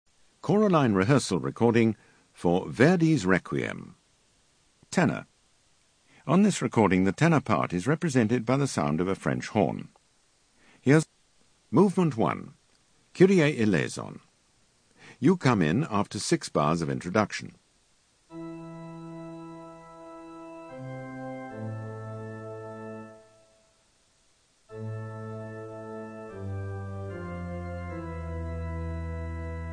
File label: tenor; Verdi Requiem